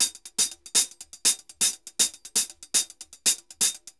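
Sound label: hi-hat, DRUMS SAMBA; Best Service ProSamples vol.14; AKAI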